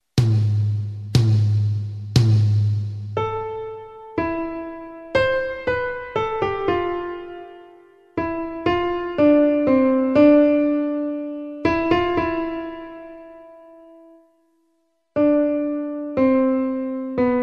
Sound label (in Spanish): dictado; conservatorios profesionales asturias